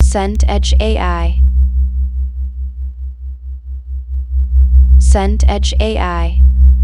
drone pulse

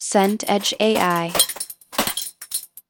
toolbox rummage

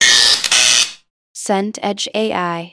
door bell buzzer

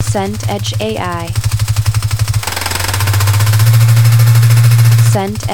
motorbike idle

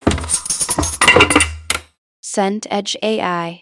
drawer close wood

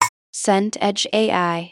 hud beep

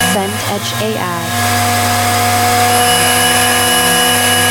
blender run

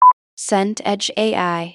beep short

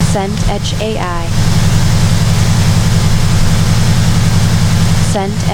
waterfall large

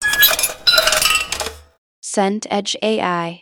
toaster pop

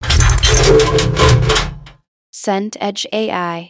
filing drawer metal

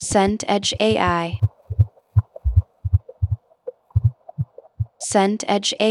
underwater muffled

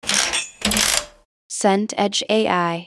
workbench vice

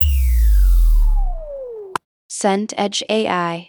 modal close

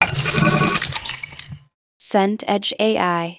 kettle pour boiling

